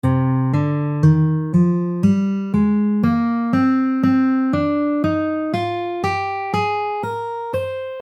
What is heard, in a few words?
D Aeolian Modes, guitar